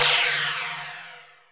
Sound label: FX, Drums